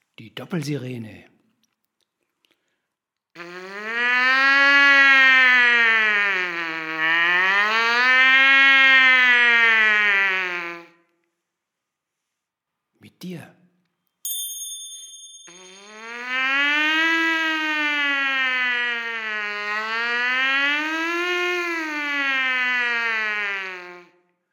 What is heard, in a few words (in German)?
Doppelsirene mit Mundstueck